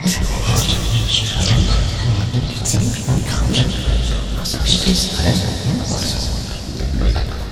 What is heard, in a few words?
Neurons Whispering; horror and atmosphere fx; Silent Hill Downpour